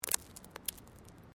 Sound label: Stone Small Move